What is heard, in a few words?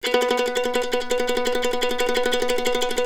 CEE TRILL B R, Blues Guitar